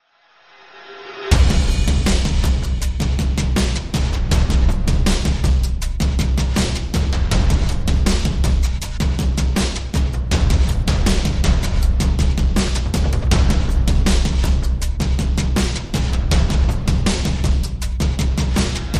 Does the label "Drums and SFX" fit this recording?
Yes